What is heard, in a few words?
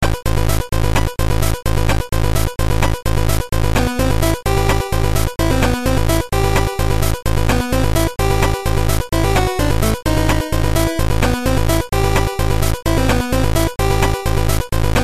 Round 4; Bomberman GB